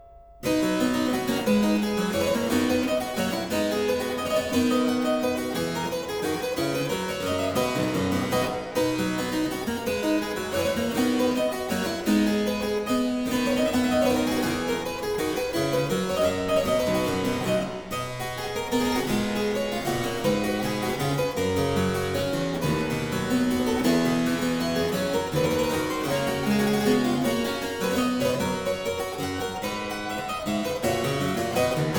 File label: Aria Variations in C Major, Harpsichord